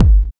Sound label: Kick, Kickdrum; Equipped Music; Loungin House